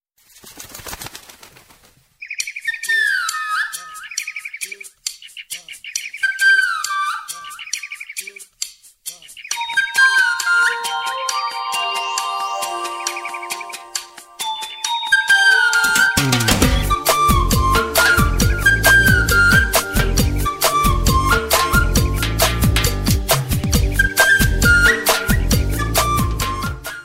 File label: Telugu love birds BG Tone